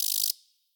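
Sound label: ambience beetle; wingsofriches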